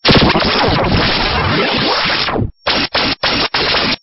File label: Stinger; STINGERS